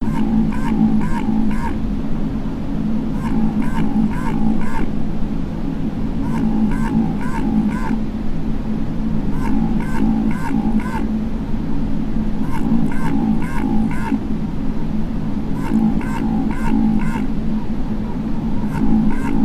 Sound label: Bio-duck